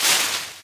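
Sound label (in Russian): хруст подмерзшей листвы осенью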